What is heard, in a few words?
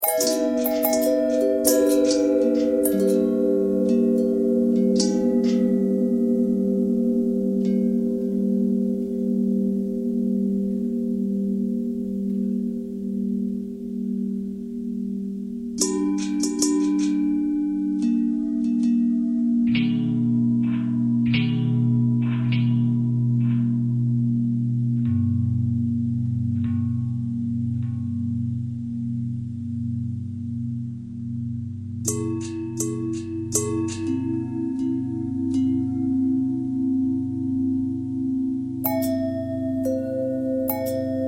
long bell thread